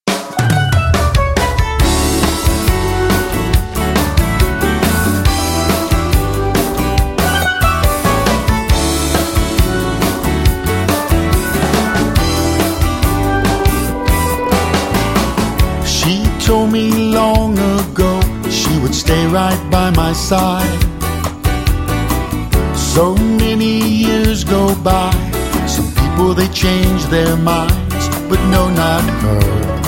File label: Tropical Country